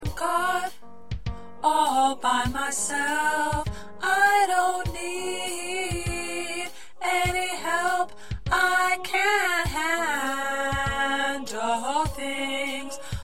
Alto, choir parts; PRACTICE TRACKS

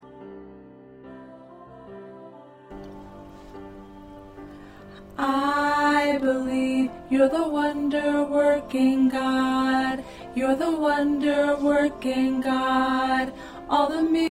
Alto

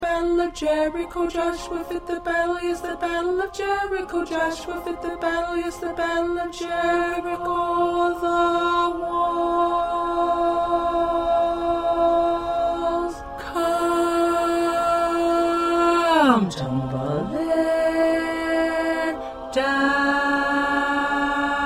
Tenor 1

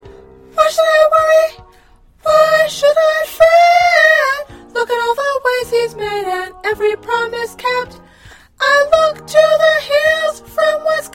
Soprano, choir

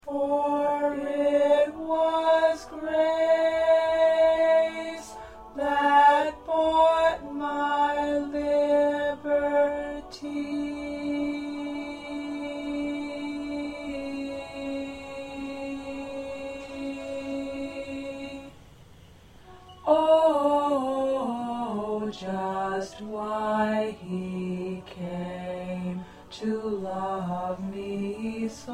2nd Alto

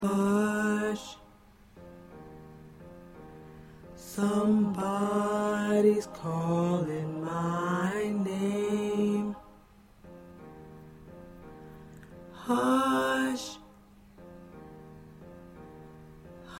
Baritone, choir

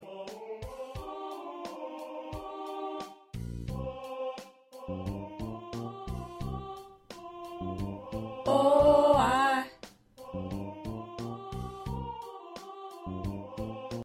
Baritone

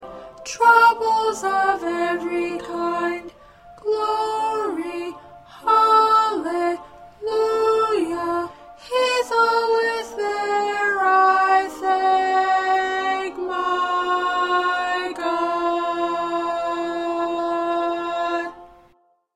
Alto 2

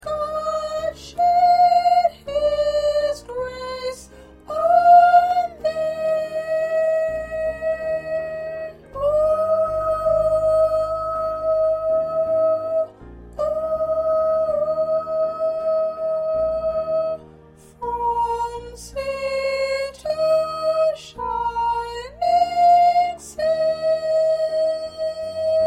1st Soprano

